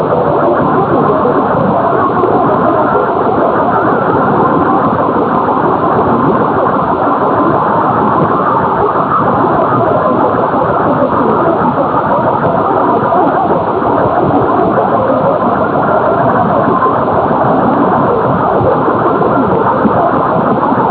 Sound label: 936 nz chinese, AM; Grayland Beach State Park, WA; Perseus & various antennas